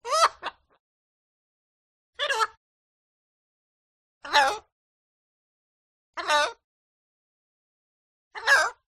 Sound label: Bird Parrot; audio hero